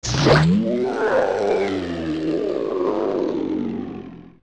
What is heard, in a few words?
Dead Hand Die; zelda; OOT